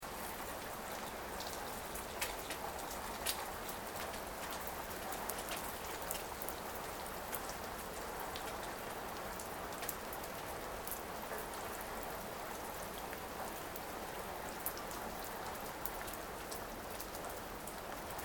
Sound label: Water Rain Light Rain Balcony Loop, Light rain on a city apartment balcony.; Weather